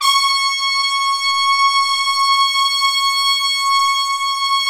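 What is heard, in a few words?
trumpets section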